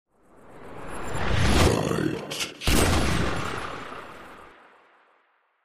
fanfare; Bitejacker

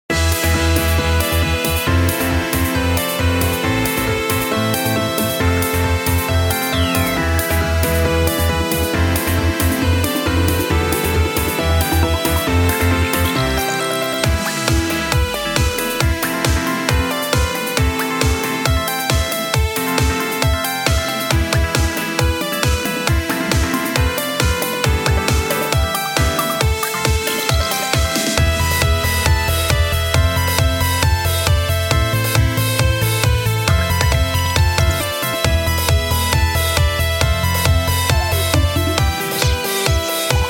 Desert; Roarr! The Adventures of Rampage Rex

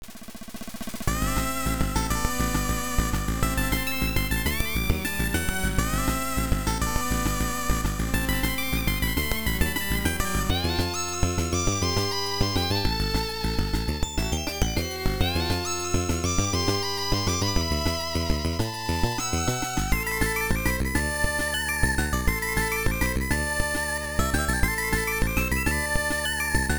Warning; SD Snatcher; MSX2

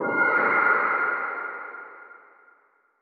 chime; chime sharp

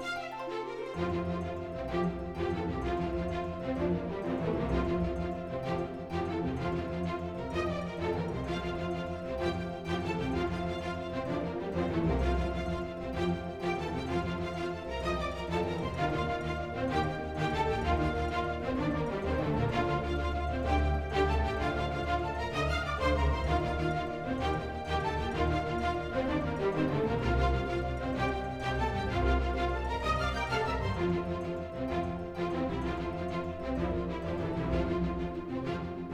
Music Short Punctuations Catchy Epic 128bpm Loop; asterix obelix slap them all 2

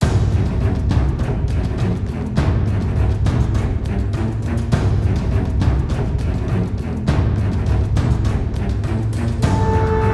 StatScreen Music; Knight Squad

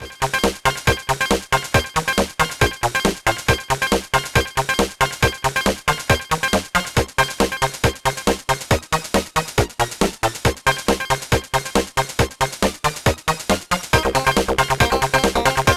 Disco; Disney's That's So Raven; GBA

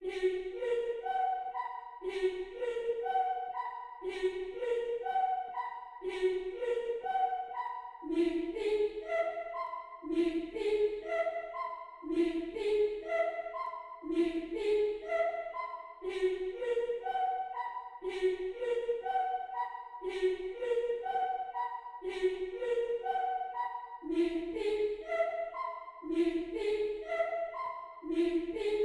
Space 2 choir

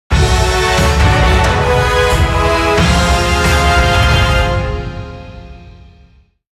Unknown Event Jingle; Metroid Prime Blast Ball; 3DS

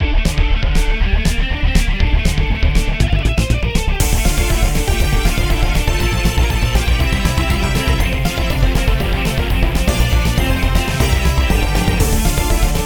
Combat Boss Full

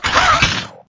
Robot Idle; Clickergame

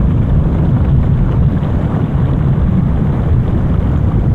Wave Underwater

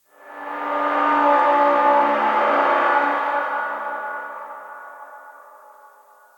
Amb Canalisation Amb OneShot

